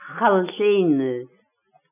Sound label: throat